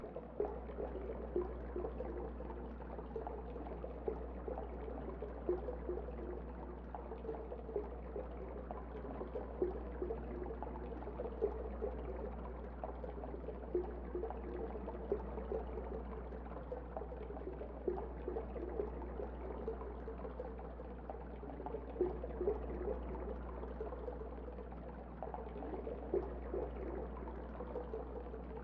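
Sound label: tunnel, poolrooms ambience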